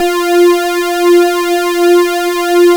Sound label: X-TRA F5, SYN